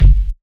low tone kick drum single shot g key

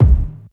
tight kick drum single hit c key

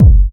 good kick f sharp key, Loudest frequency: 99Hz; kick drum samples; one shots

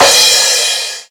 crash cymbal sample C sharp key

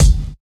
rich top end steel kick drum sound G key

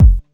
natural kick single shot f sharp key; kick drum samples

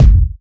round steel kick drum sample g key

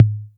low floor tr 808 electronic tom sample g sharp

